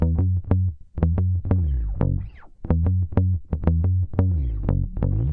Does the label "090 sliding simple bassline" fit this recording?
Yes